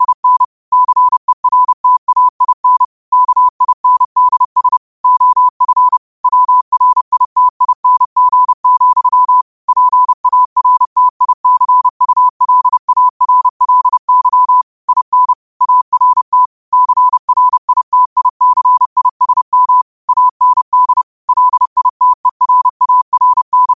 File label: 5 wpm, morse